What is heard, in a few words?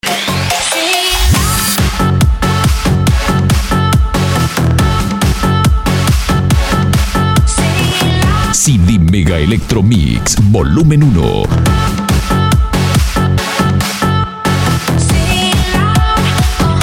mega electro mix